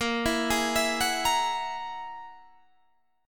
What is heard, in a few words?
Ab 7th Suspended 2nd Sharp 5th, chord